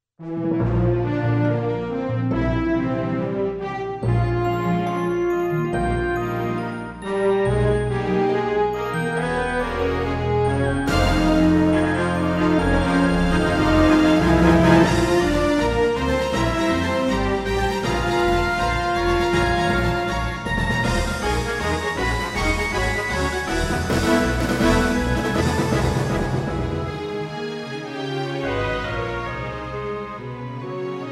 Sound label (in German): Orchester